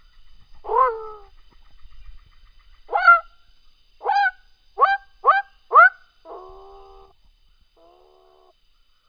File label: Pulchrana glandulosa